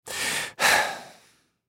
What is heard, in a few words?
Sigh Angry Male Fifty Yrs; Sighing; Blastwave